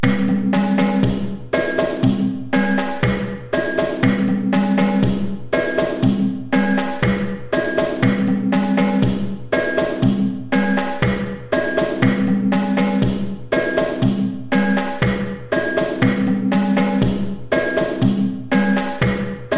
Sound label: Kpanlogo Groove 120 bpm, djembe